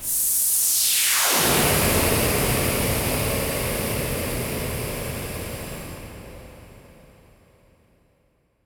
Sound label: Downlifter